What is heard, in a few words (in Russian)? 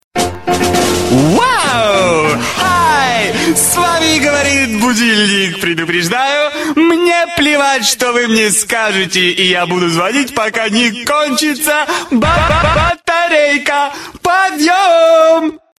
budilnik